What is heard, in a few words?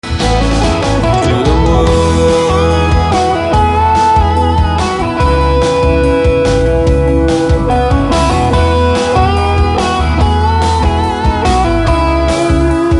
guitar solo